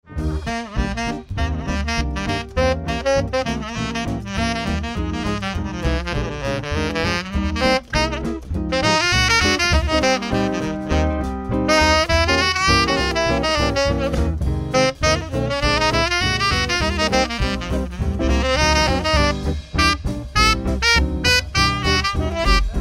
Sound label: sax, jazz